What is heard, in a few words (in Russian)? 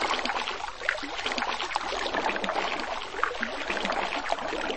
voda myagkii burnii blizkii nezhnii